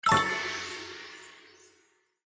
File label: SFX Reveal Magic Number, slots; Panda Majesty2